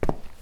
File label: Step